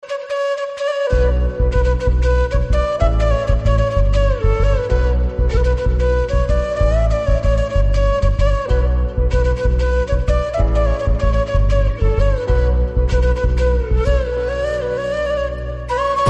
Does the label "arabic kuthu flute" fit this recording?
Yes